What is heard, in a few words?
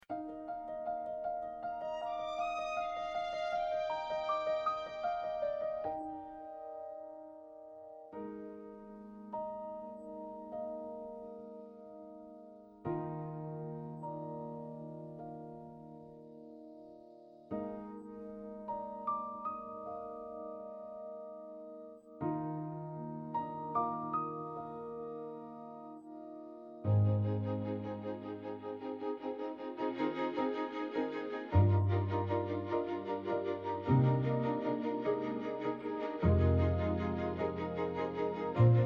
Instrumental; Auditions